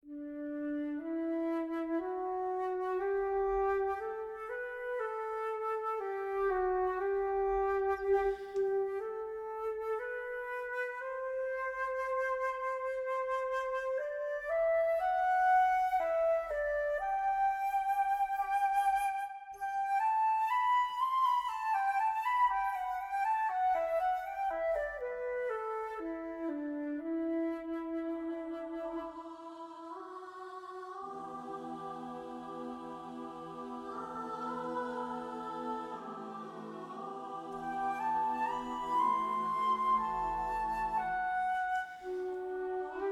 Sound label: Cypriot Lullaby with Flute; Greek Cypriot Songs